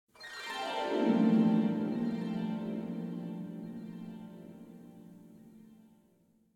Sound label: harp